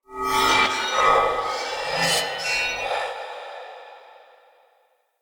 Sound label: Weapon Common Spawn; Mockery; Enemy; Weapons